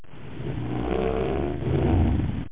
MOAN

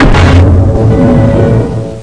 take bow; Soldat

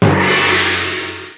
item big

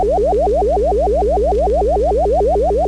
space sound, electronic sounds; Sound Effects for Multimedia - Volume 1

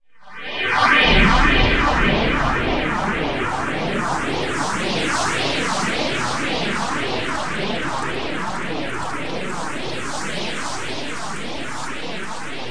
sp scream, space; Select Soft Publishing Stock Clips Multimedia Clips

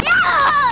F SCREAM

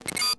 Close; Falcon 40

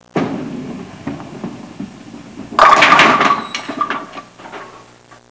bowling, cartoon sfx

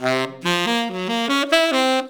SAX C; VST

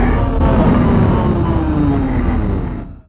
drop; Starsiege; PC Gamer IT CD 35

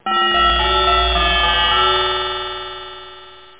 chimes

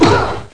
Blow

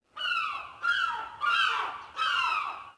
gull; Languages

